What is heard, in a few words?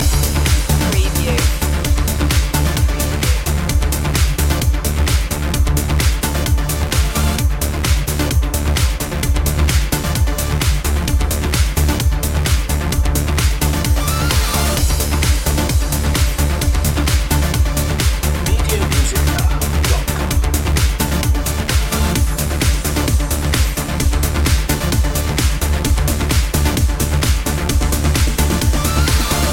electric anthem loop